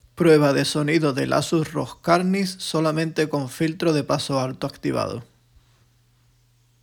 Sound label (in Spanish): Filtro de paso alto; Asus ROG Carnyx